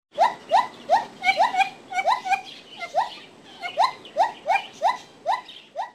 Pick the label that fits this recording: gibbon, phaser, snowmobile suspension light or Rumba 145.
gibbon